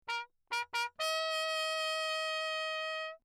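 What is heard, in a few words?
trumpet mute Lechner signal; Perinétventiltrompete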